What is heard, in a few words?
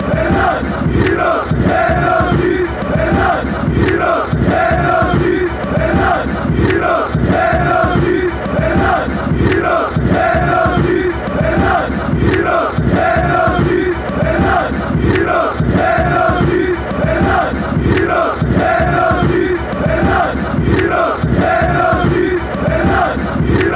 greece chant